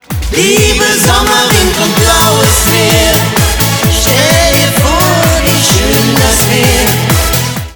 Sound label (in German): Klingelton